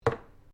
JAR PLASTIC PLACE CUPBOARD; Various kitchen jars; Set Downs; Objects

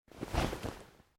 Clothing Shirt Cotton Move

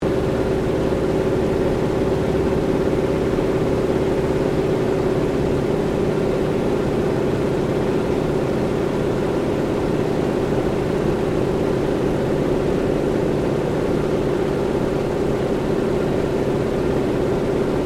AIRCRAFT CA-28 CERES FLYING INTERIOR; Airplanes; Vehicles

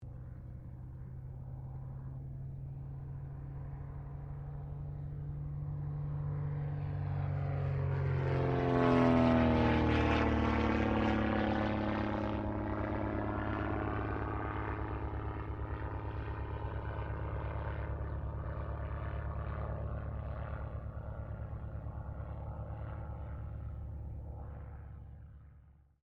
AIRCRAFT CESSNA O-1 BIRDDOG PASS; Cessna Birddog spotter aircraft; Airplanes (Sound Effects:Vehicles)

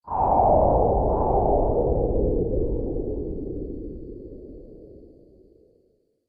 Astral Winds, Science Fiction Sound Effects

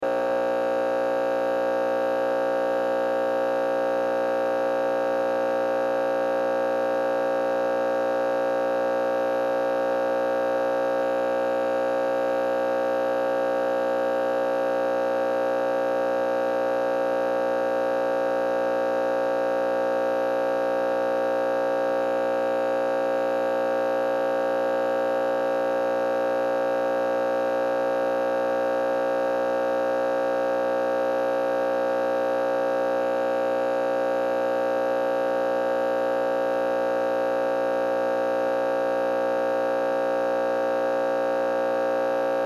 Television Hum Buzz Broken Loop; Televisions; Technology; Blastwave

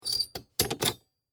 Animal, Cage, Close; Beetles; Animals:Insects; BlastWave

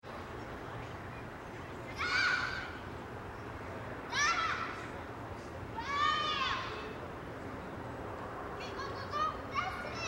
Crowd Kids Play Co-Op Park Light; Crowds

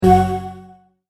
Multimedia Tone-Stop; Sound Design

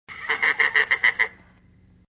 Evil Laugh Toy, Evil laugh from a Halloween toy; Evil Sound Effects; Horror

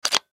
Button Switch; Multimedia Web Interactive

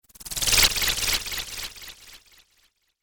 Cartoon Magic Spell

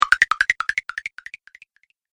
Magic Tokens Game Fx; Video Game Sound Effects; Magic Tokens 10

